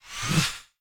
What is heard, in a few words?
whoosh Forth Farther; HeavenStudioPlus; airRally